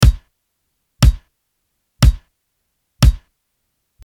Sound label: nu disco